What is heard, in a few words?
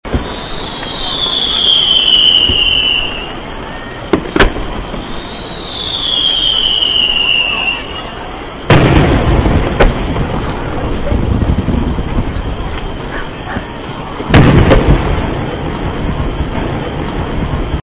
fireworks